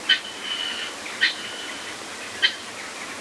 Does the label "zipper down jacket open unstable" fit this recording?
No